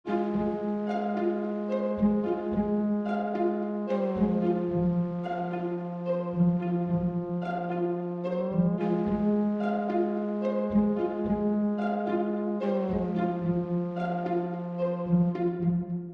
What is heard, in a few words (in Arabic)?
مزيكة اعلانات انترلودة